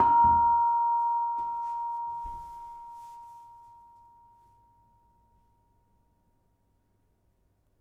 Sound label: Kempyang Pl 6, gamelan; gravityharps; timbrebox